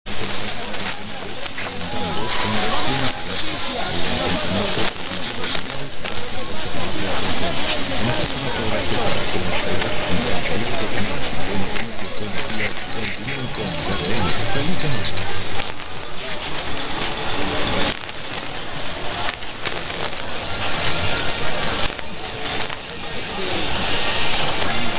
chimes, chimes, sfx; 1100 HJYZ RCN Colombia; Radio Angulo Cuba; Radio Globo Brazil; HJAT Caracol Rad

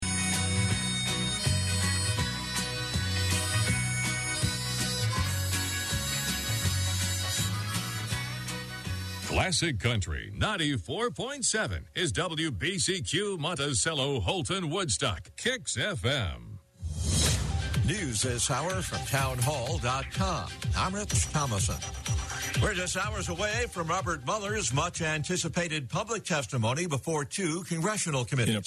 country; WBCQ Monticello ME webstream WBCQ-FM 94.7 KIXX

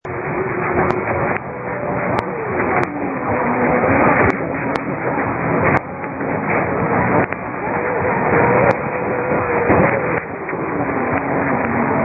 Syria

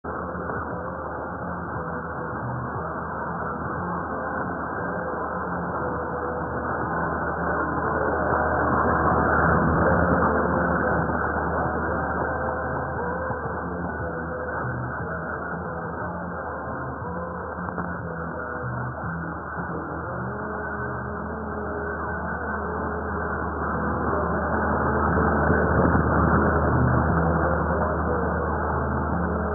Radio Farda UAE

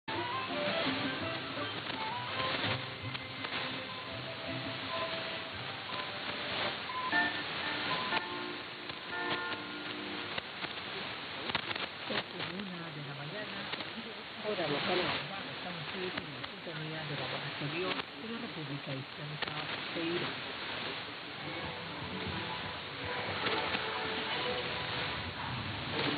chimes plus pips; poss Turkey 5 + 1; 9620 VORI Iran TOH 3 chimes

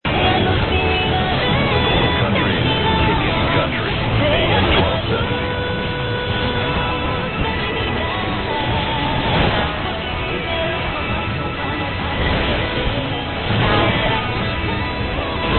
Country station; Gold Country Kickin Country

